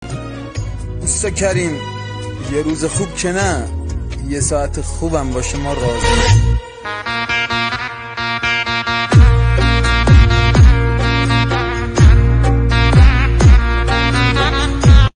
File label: music ritm 128